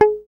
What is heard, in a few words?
GUI MUTE, E-GUI MUTED